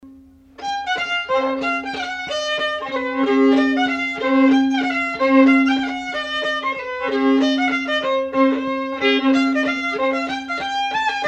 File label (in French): Mazurka